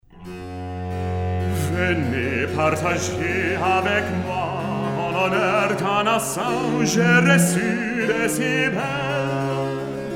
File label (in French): Recitatif et Air; Jupiter et Europe